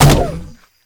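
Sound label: Launch Shroom Projectile Bounce; Warframe; Nokko; Launch Mushroom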